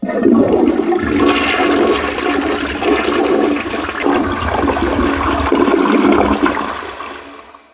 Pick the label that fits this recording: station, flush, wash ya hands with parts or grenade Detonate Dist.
flush